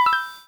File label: notify private; whale-town